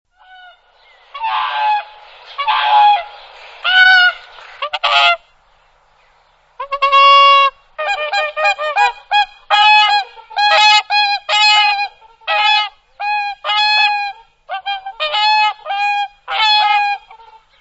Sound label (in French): cygne chanteur